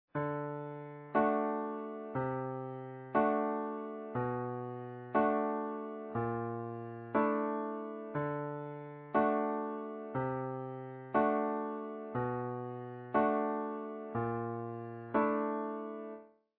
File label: d bass; suspensions